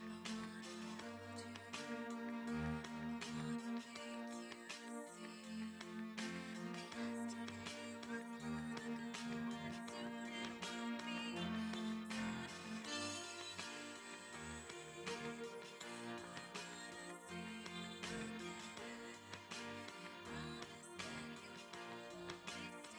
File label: piano; SiSEC11